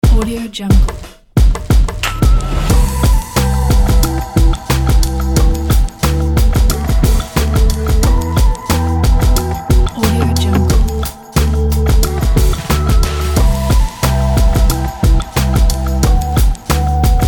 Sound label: interstellar hiphop